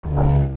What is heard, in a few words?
Hump; ReDead; zelda; OOT